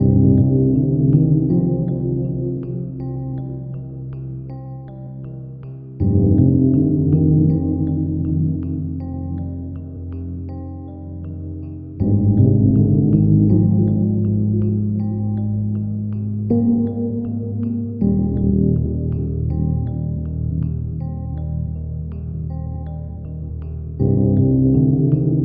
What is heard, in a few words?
Piano That is Sad, Lo-Fi; Friday Night Funkin' Vs. Agoti